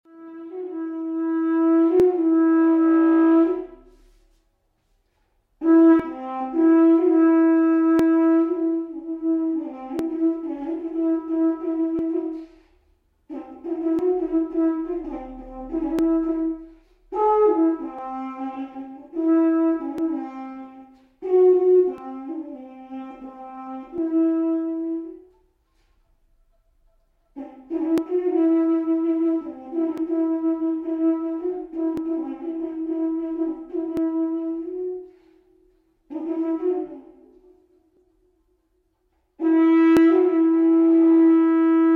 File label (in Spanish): Erke Solo